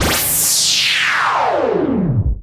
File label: Downsweeps